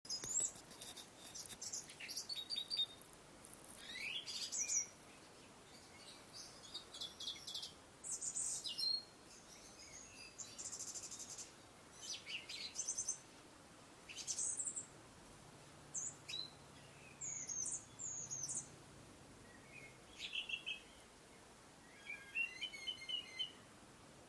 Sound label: HamsterTrackerBackyard